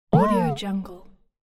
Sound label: 8bit wow